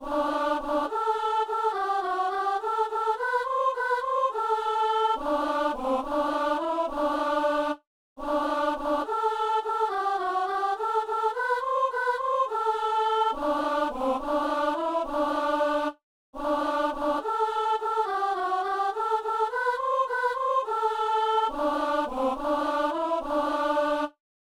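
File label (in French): soliste et ténor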